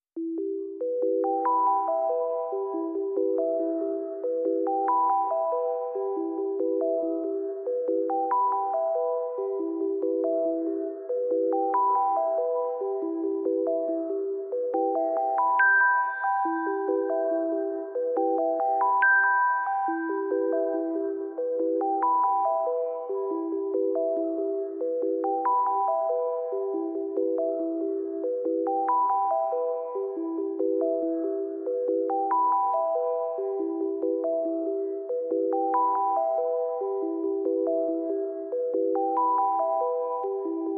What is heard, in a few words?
Arp